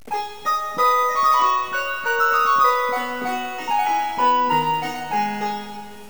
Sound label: cembalo